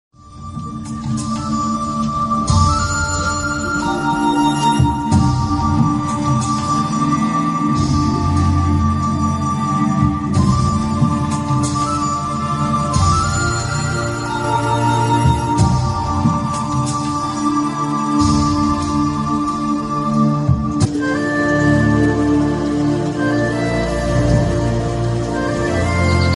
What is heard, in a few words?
flute bgm telugu ringtone; karthikeya 2